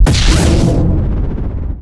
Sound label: advisor blast; Garry's Mod; hl2ep2 content